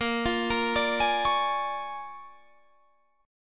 Bb 7th Sharp 9th Bb, Guitar Standard; Bb7#9; arpeggiated; JGuitar

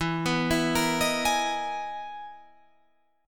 E Major Sharp 11th; chord shape

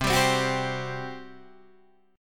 G Major 7th Suspended 4th, C

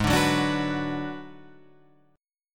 Ab Minor 7th Flat 5th

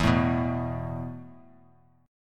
E Suspended 4th E, down; Esus4 strummed

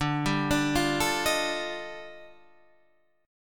Eb Major Sharp 11th D

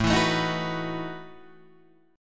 A 6th Suspended 2nd Sharp 11th, guitar; 5 7 7 8 7 7; strummed

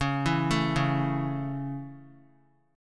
Db Minor Sharp 5th Db down Guitar; arpeggiated; Dbm#5; JGuitar

